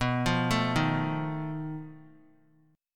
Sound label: B 7th Flat 5th B, chord; B7b5 arpeggiated; 23,24,24,25; JGuitar